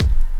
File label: KIT, Dance Mega Drums; Best Service